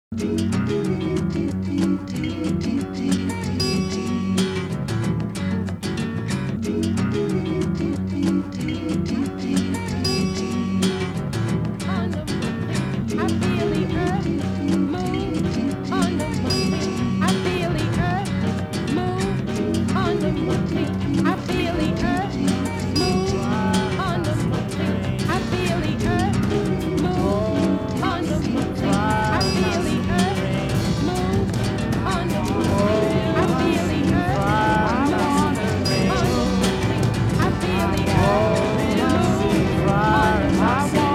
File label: 60s 70s Folkies